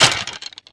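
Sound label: DMG skeleton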